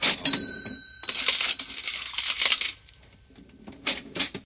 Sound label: win; RS Games Sounds